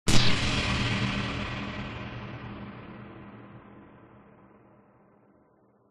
sound design cinematic hit stab sharp bright tense